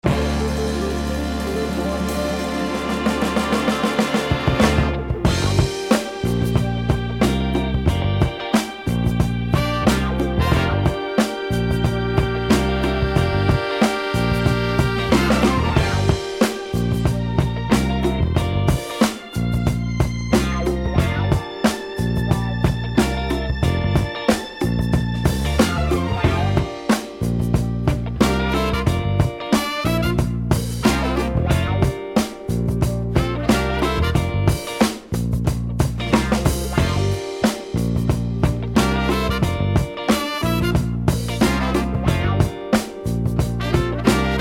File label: funk